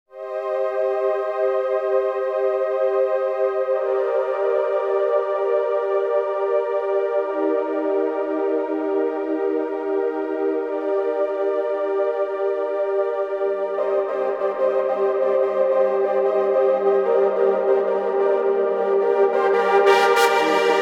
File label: Pure Strings